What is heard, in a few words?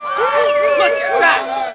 solo; WhiteOut; XBOX; crater